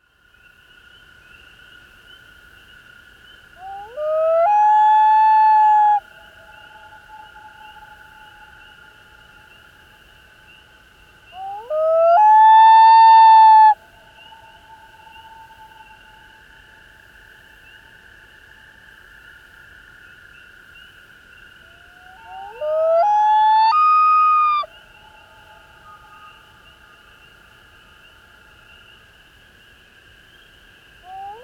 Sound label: Common Loon Wail Closeup; Birds; Smithsonian Field Guide Birds America